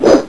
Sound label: miss